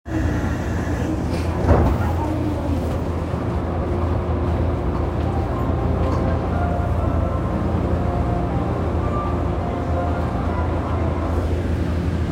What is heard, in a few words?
train sounds; 日比谷線日比谷南